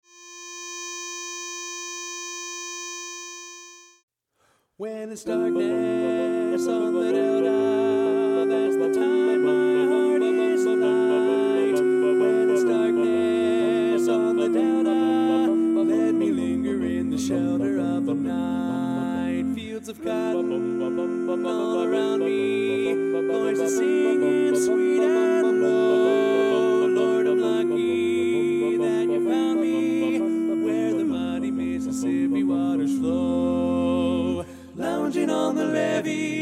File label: Tenor, Learning Tracks